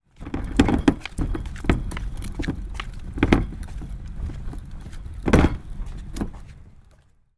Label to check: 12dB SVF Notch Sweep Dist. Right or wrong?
Wrong